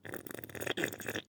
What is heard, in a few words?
glue slurp